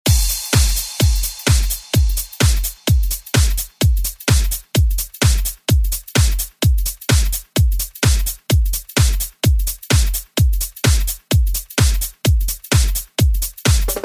90s ReDrum; DJ Mhark

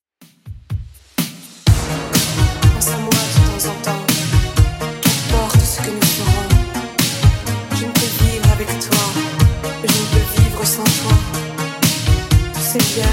80s Redrum

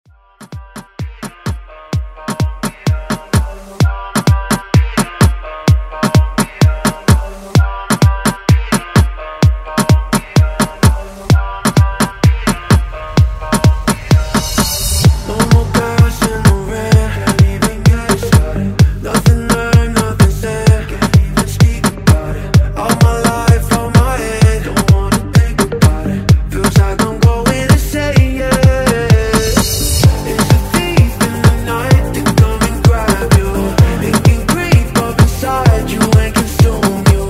Afrobeat Redrum

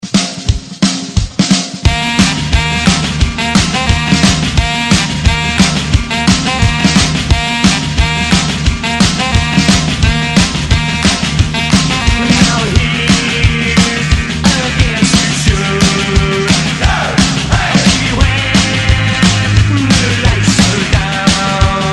ReDrum 176Bpm

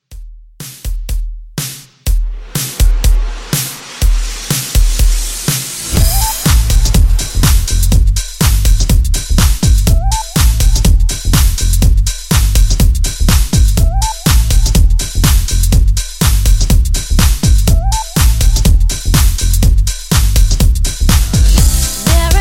90s RNB Re-Drum 123

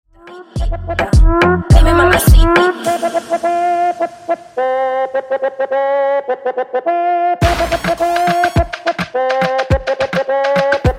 Intro Outro Dirty 105 Bpm

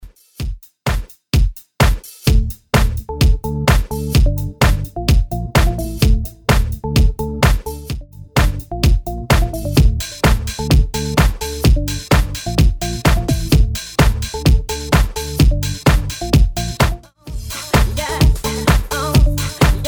90s Classic Redrum 128bpm

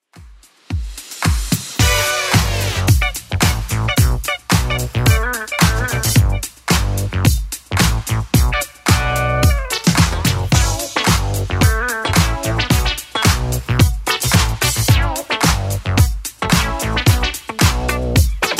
Sayonara 80s Club 110 bpm

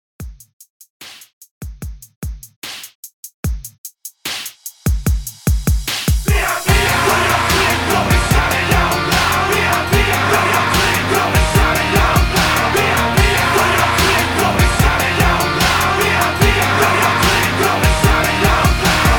HYPE REDRUM 74 BPM

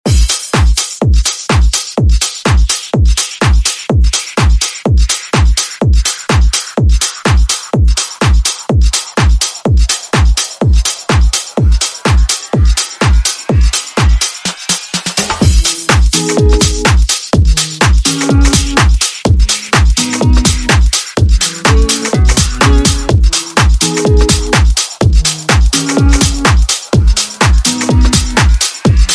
House Redrum

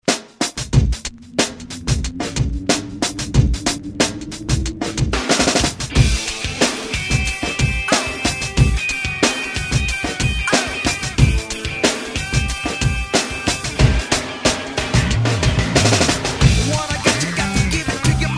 Alternative Rock Re Drum 92 Bpm; DJDOLLS

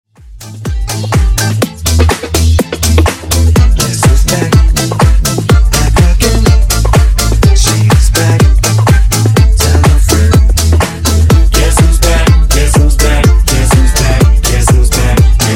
Funk Vocal Edit Clean 124Bpm

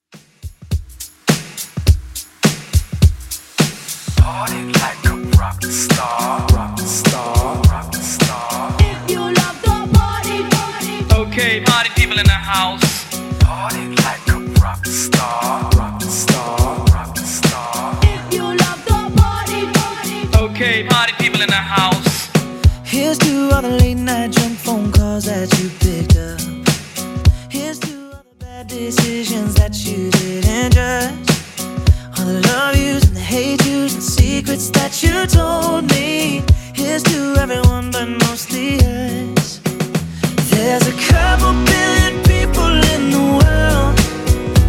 Top40 Mixshow Redrum 104bpm

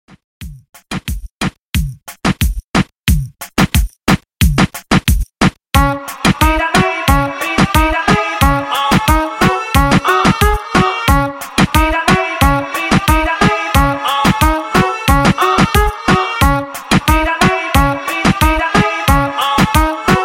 reggaeton 90 bpm

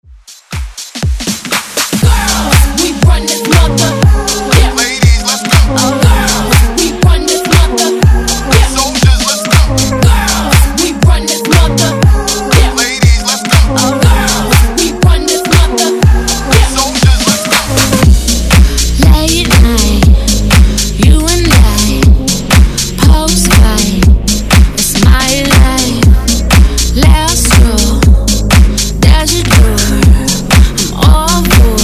Dance Redrum

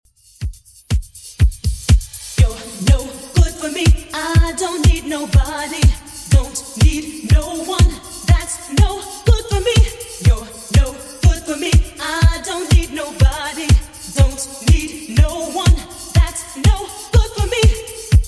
80s Redrum, 122bpm

